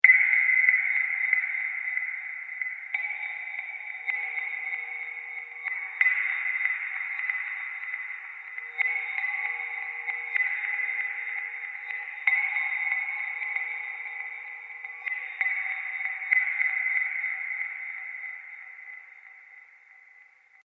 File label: Metal Reverb Ambience